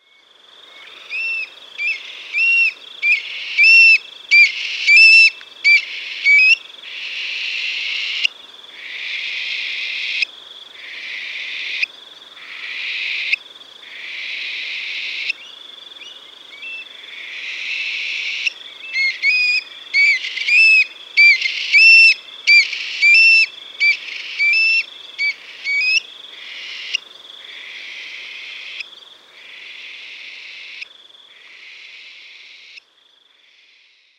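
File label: avdotka golos, stone-curlew voice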